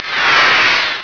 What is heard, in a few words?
FADEOUT